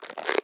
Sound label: Elastic Soft Rubber Double Creak